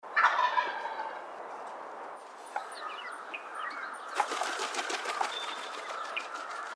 TURKEY WILD, birds; species/birds; wbba

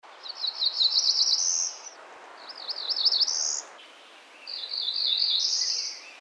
warbler cerulean; species/birds; wbba

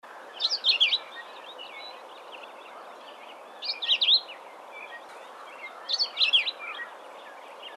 VIREO PHILADELPHIA, birds; species/birds; wbba

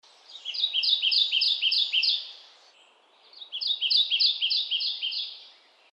WARBLER KENTUCKY, birds; species/birds; wbba

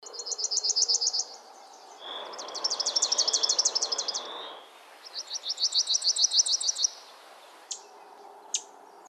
WARBLER PALM, birds; species/birds; wbba

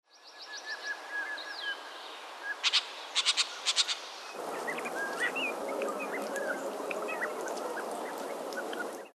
JAY GREY; species/birds; wbba

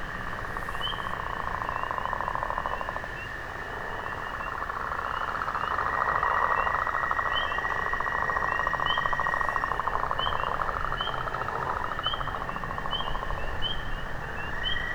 Wetland Anuran

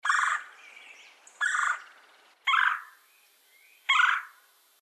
WOODPECKER RED BELLIED, birds; species/birds; wbba

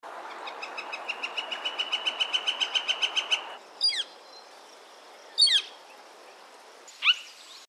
FLICKER YELLOW SHAFTED; species/birds; wbba

